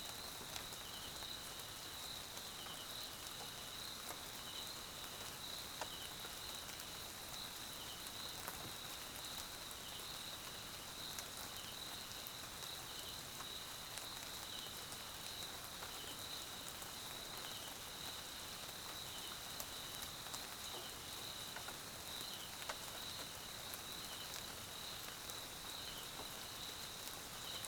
Swaziland Mkhaya Jungle Night Rain, SOUTH AFRICAN CRICKETS; Tonschliff